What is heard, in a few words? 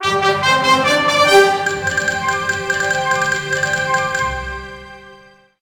News Alert; Channel 7